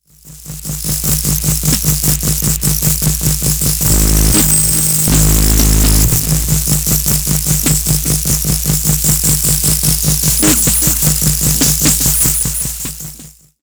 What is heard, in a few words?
Doepfer A 143 3 QuadLFO Drone